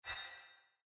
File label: roll